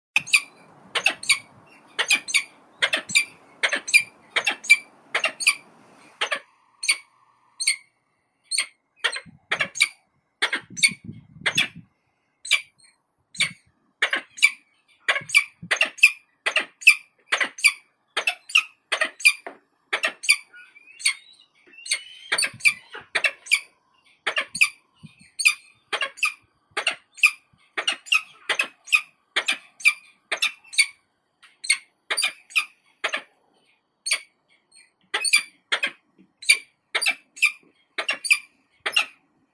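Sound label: Barbary falcon, Falco pelegrinoides; shahin; zvuki prirodi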